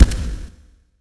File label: MudMan walk